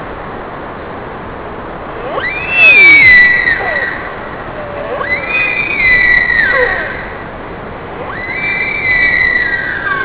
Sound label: scream